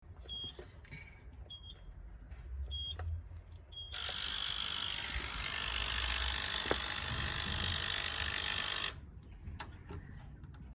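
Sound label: kod domofona i otkr, intercom code and opening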